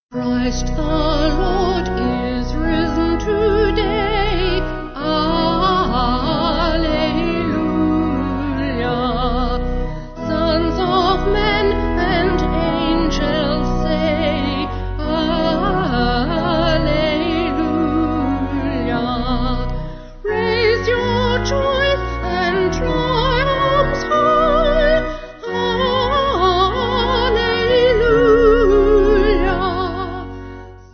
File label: Vocal Organ; E Hymn; Christ, the Lord, is risen today, Alleluia!